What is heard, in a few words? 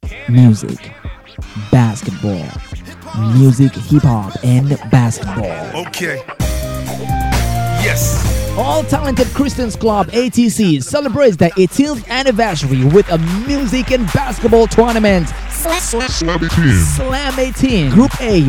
Basketball Tournament Jingle; ATC Slam 18; Abuja